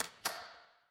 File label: door lock